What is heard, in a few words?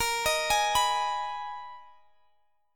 Ab Minor Bb, chord; Abm/Bb; arpeggiated